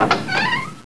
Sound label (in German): tuer auf; dorf